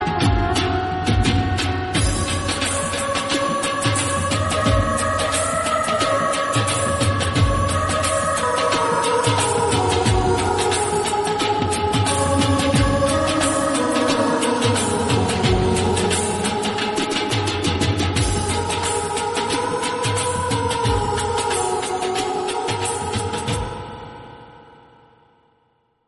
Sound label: motivation background music instrumental bgm